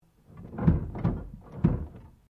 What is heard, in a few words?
ORGAN PUMP FOOT PUMP, Old portable church organ; Keyboards; Musical